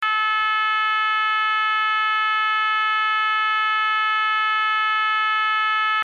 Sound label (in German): ton, Signalgeraete